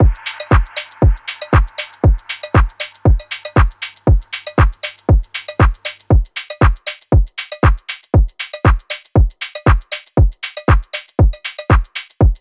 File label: Drum Loop 118 BPM; Pacha Drum Loop; Cymatics